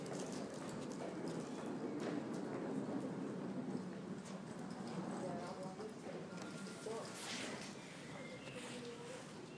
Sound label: cheltenham spa station